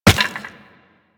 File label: Run Bone, Footsteps; Warframe; Operator